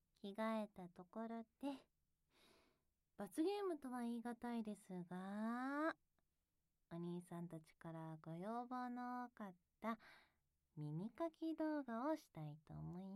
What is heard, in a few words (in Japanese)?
ゆったり耳かき耳舐め SEあり, ASMR; 一旦エッチはお休み。